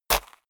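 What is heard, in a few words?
step walk dirt, footsteps; schritte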